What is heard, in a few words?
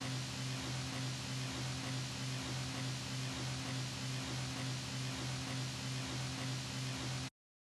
intro noise